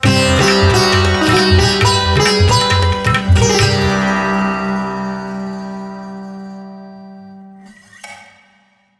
Match Win; Great Tiger

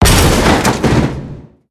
Character Body Impacts

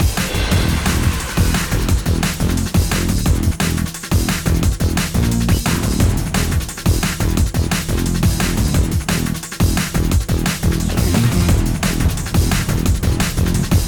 Menu Lobby Option Black Market BGM Loop; crossfire online

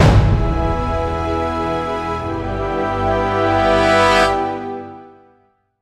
Music Stings; Crater

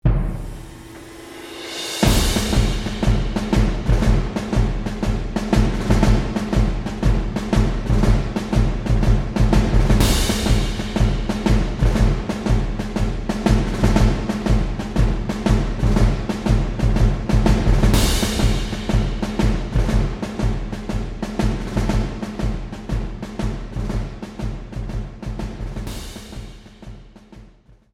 bgm desert battle boss; world desert

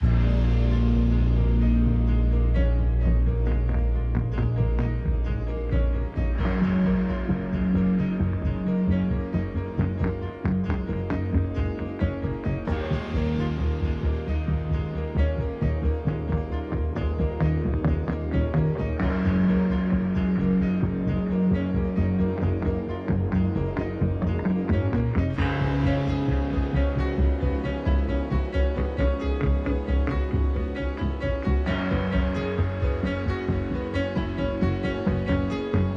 Music Mission Briefing; Clandestine

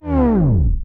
Tape Stop

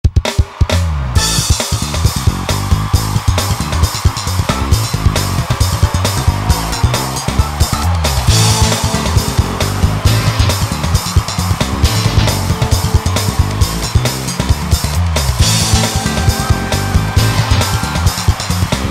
Race Menu (With Ambience); ps1 gamerip 1999; Gran Turismo 2

